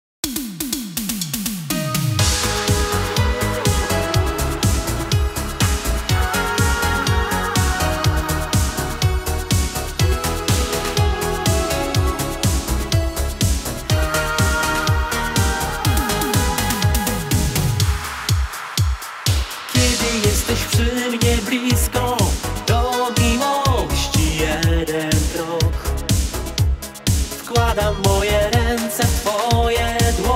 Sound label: disco polo